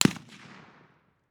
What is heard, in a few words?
gunshot rifle